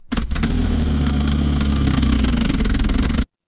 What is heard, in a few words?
mechanical engine